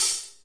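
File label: urban trap hi-hat; Urban Trap Kit; Hip Hop; STYLE KITS (Hip Hop, Etc)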